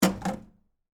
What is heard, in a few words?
Arcade Game Pistol, Technology, Arcade, Game, Pistol, Revolver, Remove, From, Holster; SoundEffects-BlastWave